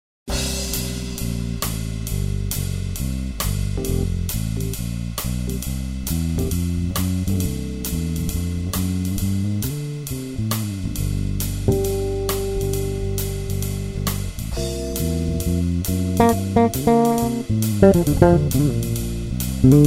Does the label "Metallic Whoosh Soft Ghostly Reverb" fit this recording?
No